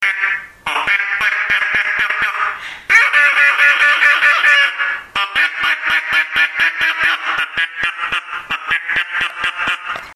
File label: duck call